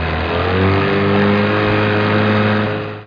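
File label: SMALL BOAT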